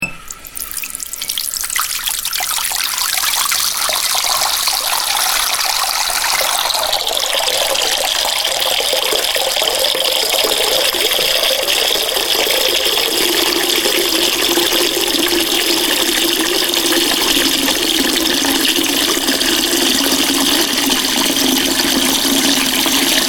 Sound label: Filling The Sink, Turning tap on an filling a sink full of water; Bathroom; Household